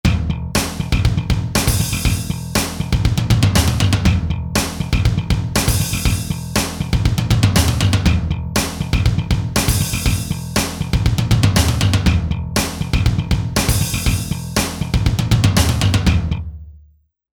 Hard Rock

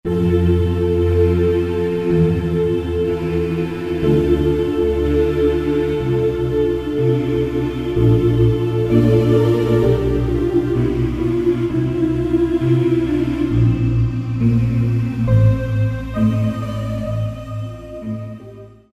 Hummmm, Alesis Z3; Sanctuary

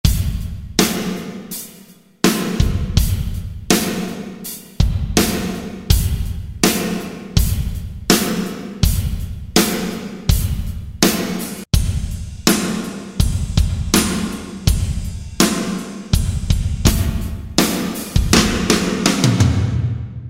Power Ballad, Drumscapes; Northstar Productions Drumscapes Vol.1

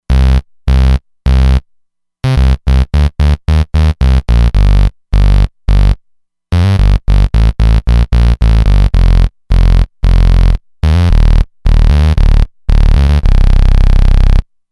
Snoop Bass, synth bass

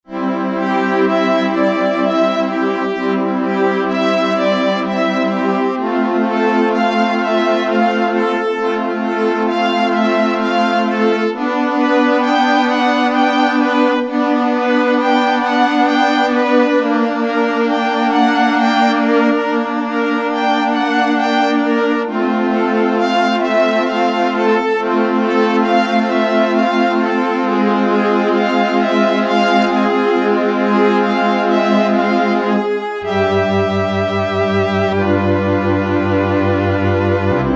Hall Orchestra, Yamaha TX802; Performances; Factory Patches

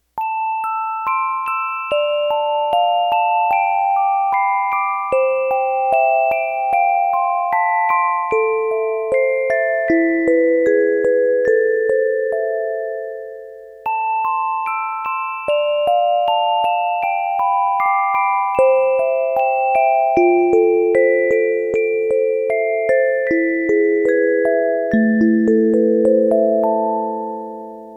Music Box; Roland VC-1

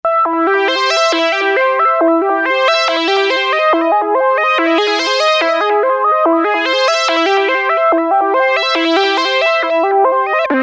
Lead, synth

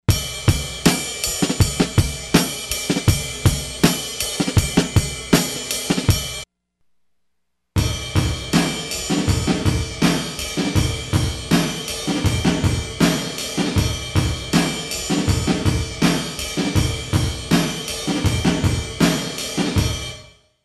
Medium Wood Room, Roland SRV-3030; Preset

